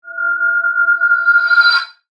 Synth Sweep Flutter, Production, Element, Synth, Sweep, Flutter; Sweeper